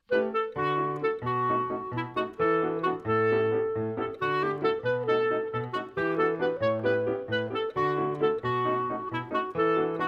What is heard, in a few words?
playbacks; barouf